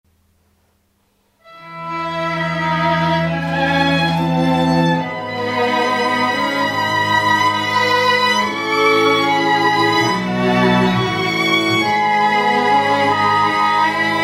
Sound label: String Ensemble; italian String Ensemble; wedding